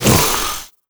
grunt soft, headcrab black; creatures; vox

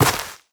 step, footsteps player grass

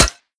pottery, bullet impacts; physics; Half Life Model Pack

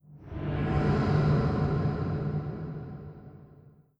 vox wet, strider, combat, idle

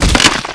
antlion guard shell crack; npc; Half Life 2; Episode 2

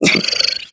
run grunt, headcrab reviver; creatures; vox

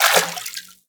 fs fm water, footsteps; player; Portal 2

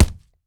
body impact fists; physics

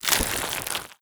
foley, zombie revived; scripted intro; Half Life Alyx

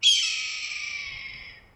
eagle; ambient; anubis

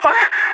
scared emote; agents; leet

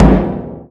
metal barrel impact soft; physics

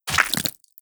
debris flesh, bullet impact debris

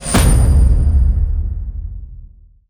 inventory new item; ui

